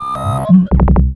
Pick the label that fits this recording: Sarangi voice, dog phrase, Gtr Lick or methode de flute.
dog phrase